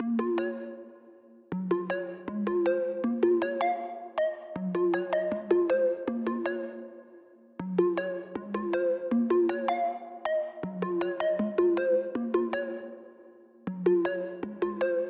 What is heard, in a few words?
bells, 158 BPM A# Min; Cymatics; Waveracer